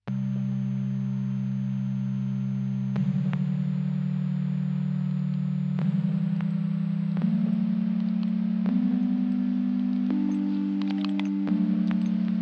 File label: Lullaby Machine